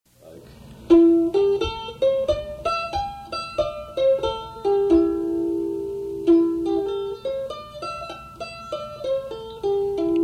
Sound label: Ab Arpeggio